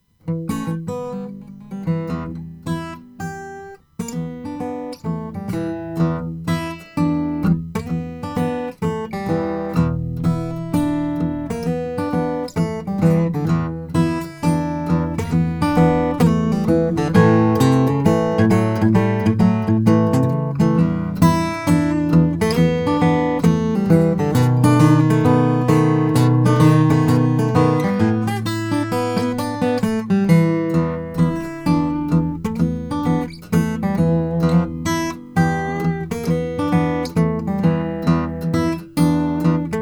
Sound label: square deal guitar; sunburst